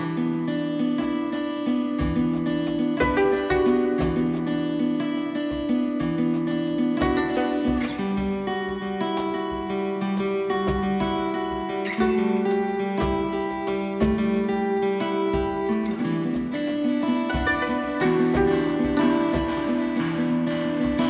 Training Fullmix 120BPM, enchanted forest fantasy rpg; enchanted forest fantasy rpg kit